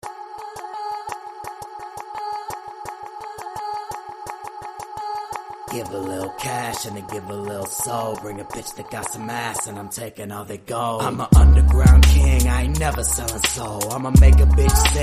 Remix, hip hop/rap

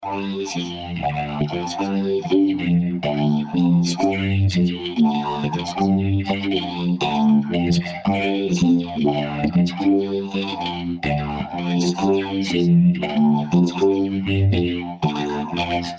electronic, 120, G, Acapellas

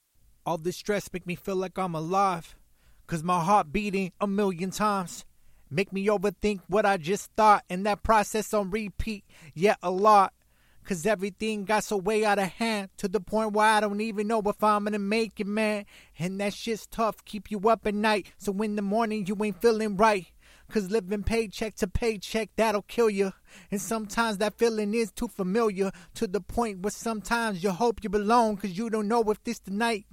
Acapellas, 137BPM Key Am

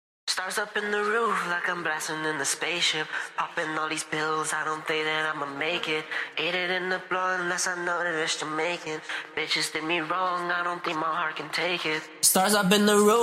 hip hop 160 A sharp, Acapellas

